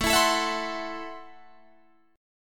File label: A Minor 6th A Guitar DADGAD